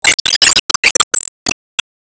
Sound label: Sys Timeattack Icon Rank Up